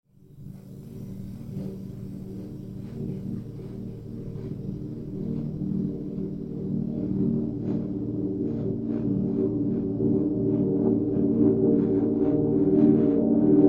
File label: ambient piano